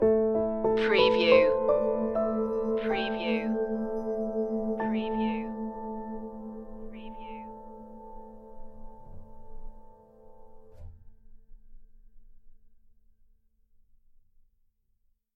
a glow stinger

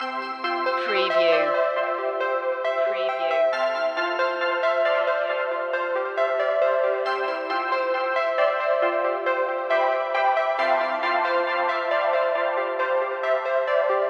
tones of aqua loop

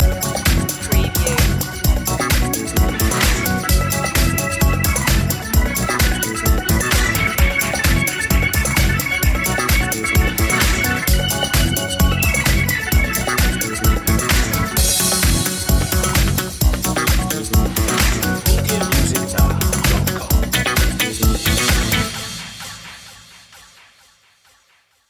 jumping cars loop end; media music now

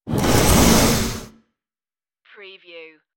starship sliding door

